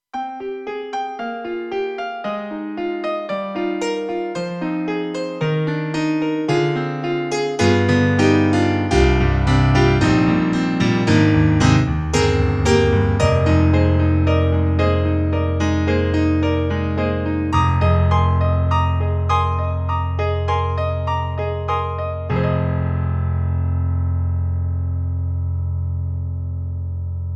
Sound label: KORG Velo Piano ST, TRITON-Rack; EXB-PCM08